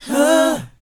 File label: BOYZ C#, Vocal Planet R&B & Dance; ILIO